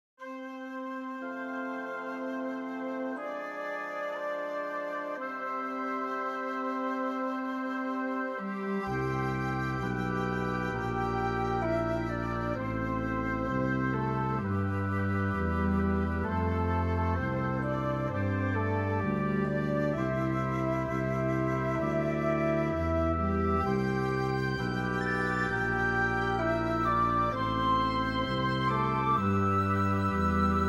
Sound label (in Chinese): 降旗版 anthem